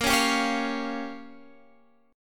A sharp Minor 6th A sharp down Guitar Standard, chord; strummed